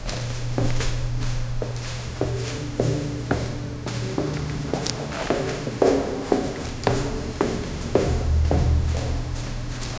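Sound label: a gentle swell of orchestra, theatre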